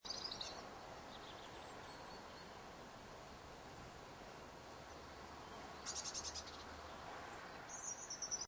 Light Wind And Birds Loop Short, Outdoor ambience, light wind and few birds; Outdoor ambience, light wind and few birds. Short version.; Weather